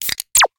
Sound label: Card create; Zenonzard